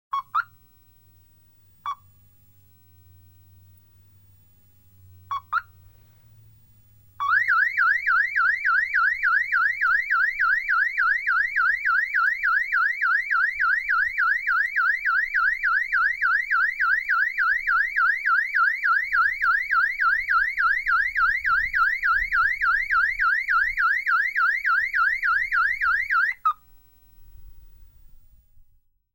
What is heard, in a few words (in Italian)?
antifurto auto, suono antifurto auto; sirena degli antifurti delle auto